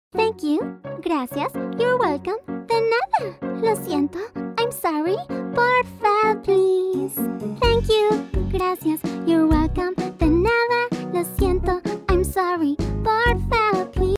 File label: Hello Hola sing along learning songs